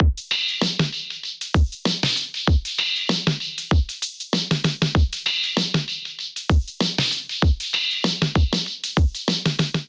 Diatribal Kit 97 bpm, Drums, Basic; MIDI Clips; Drive and Glow; Ableton; Factory Packs

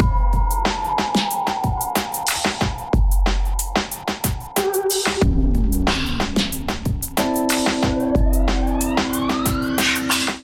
Kit Melodic 92 bpm, Drums; MIDI Clips; Numerology; Ableton; Chop and Swing

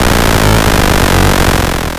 Zipper FX; Effects; Ableton; Creative Extensions; Poli

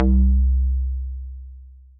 PVC Pipe Drone; Bass; Ableton; Glitch and Wash

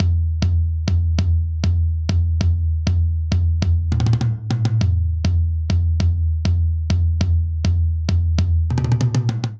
Reggaeton Toms Only 100 bpm; 4 4; MIDI Clips; Ableton; Factory Packs; Drum Booth

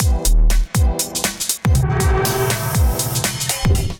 Hard groove 137 bpm; MIDI Clips; 120 - 150 BPM; Sol Hard groove; Ableton; Factory Packs; Skitter and Step

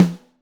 SN Top, Snare; Ableton Foundation FreePacks; Snare Designer

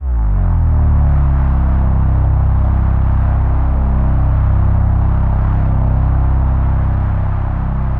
Dronewind Bass; Instruments; Evolving Bass; MPE; Ableton; Factory Packs; Drone Lab

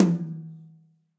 Tom Multirod High Multirod Dry; Drums; Drum Hits; Ableton; Session Drums Studio; MPE

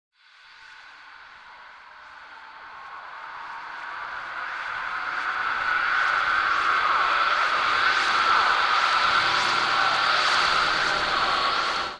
Hourglass Texture 80 Bpm; MIDI Clips; Instruments; Ableton; Drone Lab

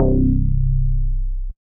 Syn Bass; Synth Bass; Stub Nub SynBass; Ableton; Factory Packs; Singularities

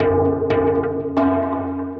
Desert Drumming, Playful Percussion; Ableton; Mood Reel

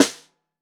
SN Bottom, Snare

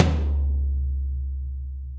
Tom High Floor Ambient Loud Punch, Drum Hits; Standard Hits; Ableton; Factory Packs; Session Drums Studio

